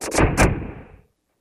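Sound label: Starship Force Field Effect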